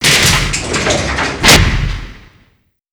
armory door open; gulag; Call of Duty Modern Warfare

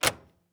plastic case heavy close; Escape from Tarkov

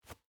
footstep hide dirt jump start; Rust

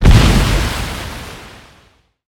damage hits explosions; World Of Warships

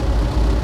flaps loop

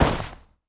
snow; Rust

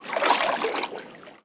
Amb Weather; Red Orchestra 41-45

